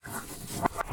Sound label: Special Photo Crayon Place